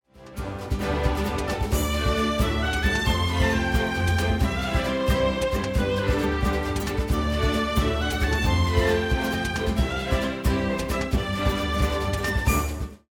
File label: won round; music kits custom